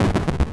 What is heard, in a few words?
trolley